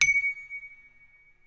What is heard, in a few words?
Glock